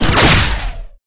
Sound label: hit, weapons